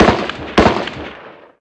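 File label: gun m16 medium; ModernWarfare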